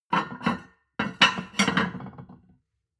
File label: plate on plate place multiple, restaurant cafe